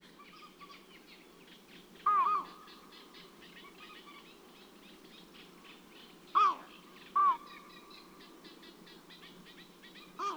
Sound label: Eurasian Bittern Flight Calls Of Two Individuals